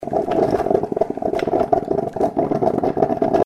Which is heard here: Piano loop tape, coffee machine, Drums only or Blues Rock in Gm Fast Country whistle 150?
coffee machine